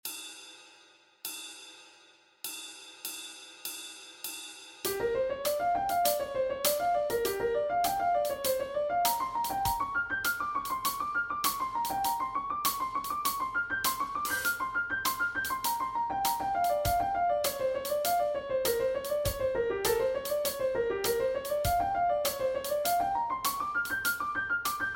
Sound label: Backing Track, walking bass